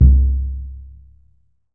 Surdo

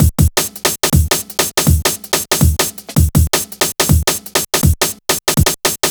Jam, Drum Loops, Jungle, 162; Jungle Warfare 1&2

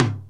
RX7 NORM TM; Crypton - DrumBase5000

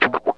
WAH SNIP, Funk Gtr & Wah

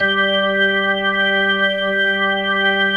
ROCK ORGAN

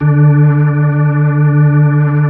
B-3; Roland LCDP10 Keys of the 60s and 70s 2; Full Xfade

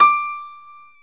PNO Grand Pno; Club-50 - Foundations Roland

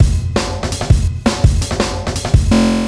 Blasted Funk, Drum Loops

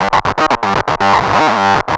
Analoop, Industrial; Analog; Analoops; Sonic Foundry (Sony Creative Software)

